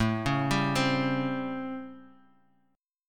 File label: A 7th Sharp 9th, Guitar, Standard; A7#9; arpeggiated; JGuitar